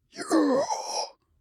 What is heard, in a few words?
Sentry Startle